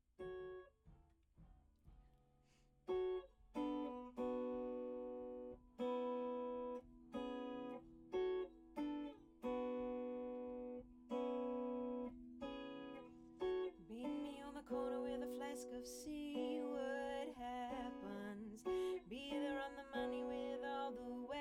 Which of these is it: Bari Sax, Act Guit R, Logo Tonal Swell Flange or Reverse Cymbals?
Act Guit R